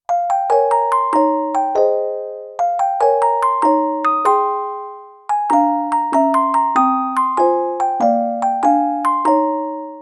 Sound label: applause; AriizuCA's Skin V3.17.6 Tail Yellow